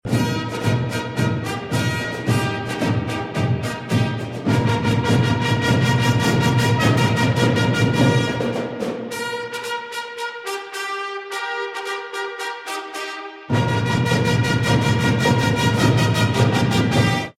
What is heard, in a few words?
Slow Fanfars